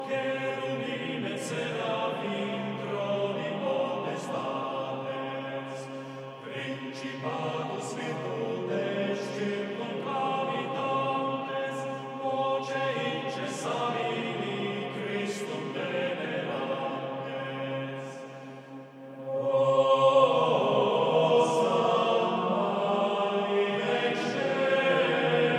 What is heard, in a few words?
Sanctus cum tropo; Schola Cantorum Riga; Missa in Dedicatione Ecclesiae